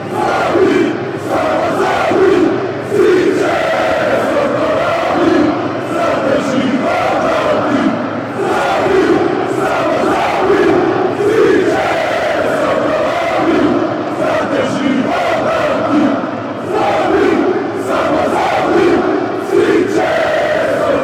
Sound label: croatia chant